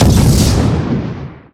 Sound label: Explosions Medium Explosion